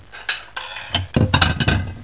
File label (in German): Teller